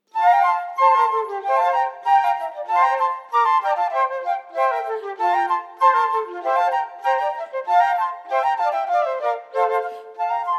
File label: Colonial Country Dance